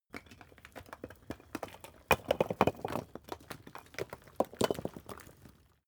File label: stone medium landslide short